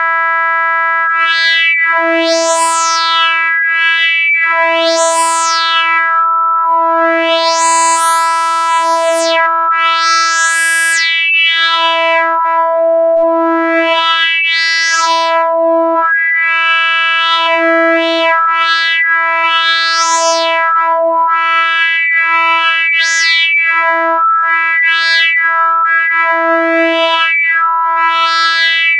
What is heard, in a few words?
vps resonant